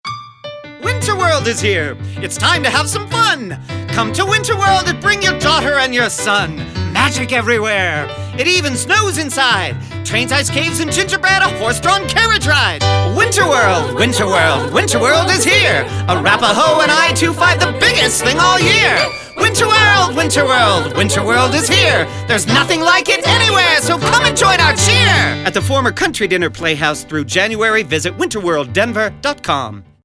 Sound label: Winter World Jingle